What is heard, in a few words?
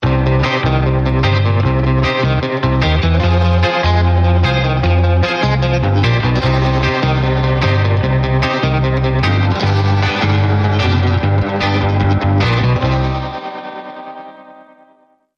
Jingle No Drum